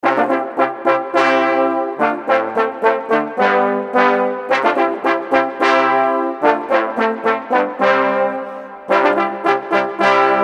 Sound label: Sackbut Fanfare